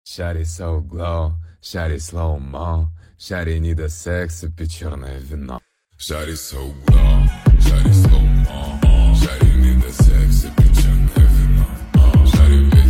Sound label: 35-39hz Low Bass